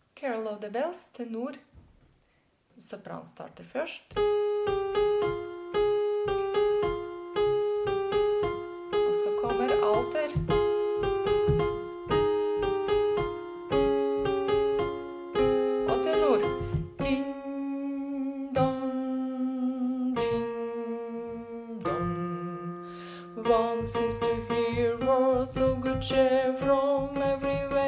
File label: tenor; Carol of the Bells